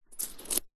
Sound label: money coins small x4 pick up from carpet; foley; zapsplat